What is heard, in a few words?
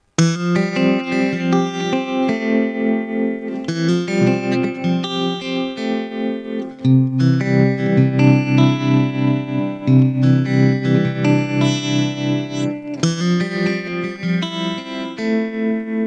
Tele N B, pickups